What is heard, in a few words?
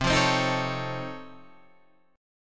Db Augmented Major 9th, C